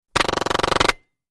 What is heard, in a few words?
Silenced Machine Gun